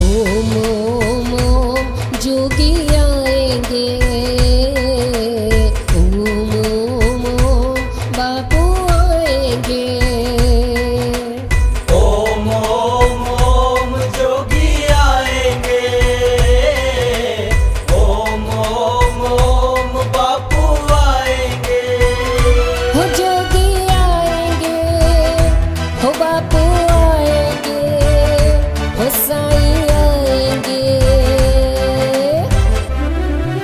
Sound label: Ringtone; hariomaudio; bhajan